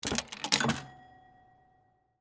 Arcade Game Bowling, Technology, Arcade, Game, Bowling, Machine, Insert, Quarter, Vintage; BlastWave